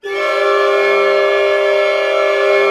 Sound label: Clusters; String Master Series; ChordCluster